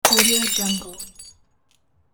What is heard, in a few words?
glass break long; transitions and movement